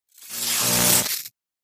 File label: Elect Arc Shrt Circ, arcs & sparks